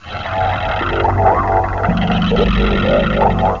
robotic; avenger

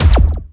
laser; StarSiege

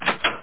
DOOR OPEN; marathon

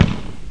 bang

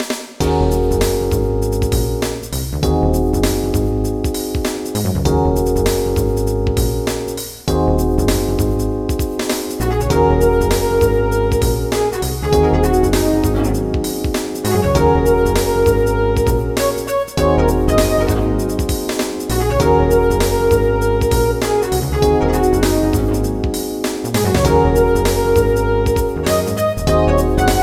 groove

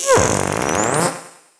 stingray attack; DAoC

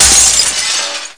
sml, impact glass; SINDEMO

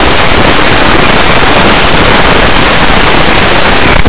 gear

